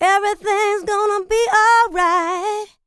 LICKS, Vocal R&B & Dance; ILIO; Vocal Planet

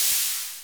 HH, Watford-Electronics Rhythm-Generator

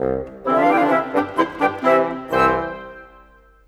Brass Winds; Sonic Foundry (Sony Creative Software); Orchestral Series VOL-3; Cinematic 20

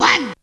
toon; Zero G - Man Machine

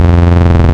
Freq Box Saw, wavetables; Animoog

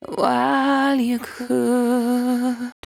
74-5bpm B, Velvet Vocal; While U Could; Zero-G

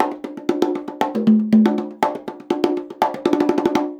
120 CONGAS, Percussion Loops; USB Soundscan vol.36; AKAI

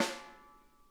snare, drum kits

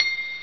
Oriental Bells; ST-XX Amiga Soundtracker Sample Packs

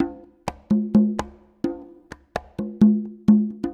Loops 128BPM, Drumloops

